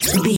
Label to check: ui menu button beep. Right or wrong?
Right